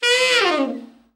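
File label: TENOR overblow FALL, Sax; Giga Samples Collection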